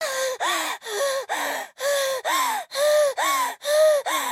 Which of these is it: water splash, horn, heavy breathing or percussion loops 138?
heavy breathing